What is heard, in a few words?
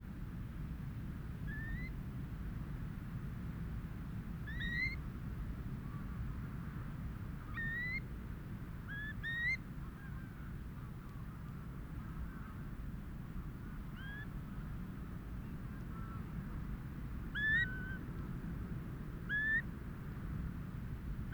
long low